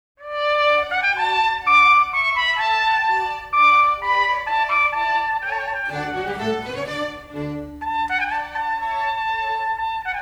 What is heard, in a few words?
Concerto for Trumpet and Orchestra